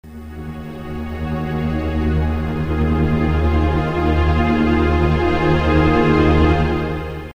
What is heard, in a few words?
susp